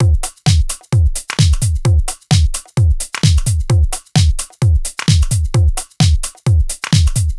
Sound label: Full Loop 125, Loungin House; Equipped Music